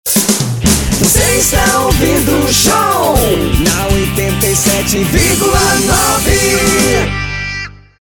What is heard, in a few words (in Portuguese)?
vinheta